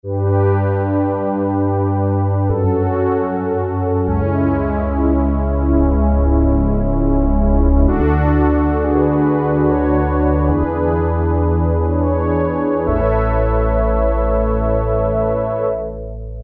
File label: Mellow Pad, Roland MKS-70